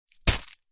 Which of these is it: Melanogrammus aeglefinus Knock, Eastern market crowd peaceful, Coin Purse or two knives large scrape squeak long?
Coin Purse